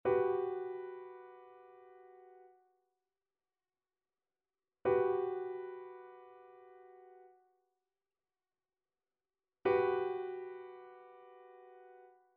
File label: trichord; trichord identification